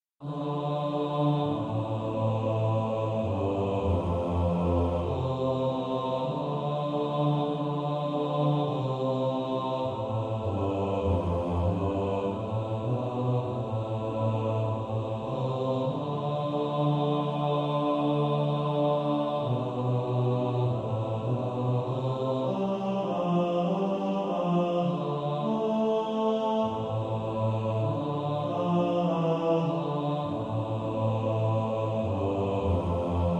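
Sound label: What wondrous love is this bass, choir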